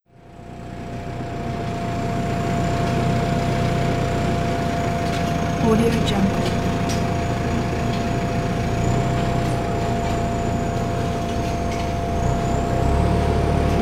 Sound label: construction bulldozer; soundfood